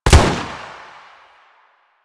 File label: Shield Lancer Pistol Shot; Warframe; Lasrian; Lasrian Soldiers; Weapons